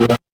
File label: retro game text blip